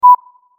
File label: countdown beep; sfx; teststrecke game